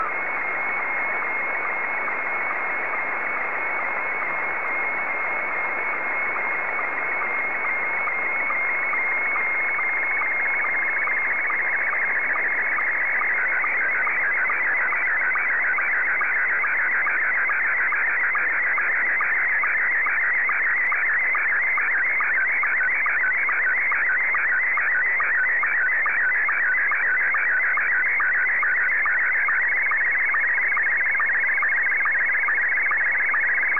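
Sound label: Scottie 1, slow-scan television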